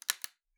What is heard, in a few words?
Palette, WoodenPalette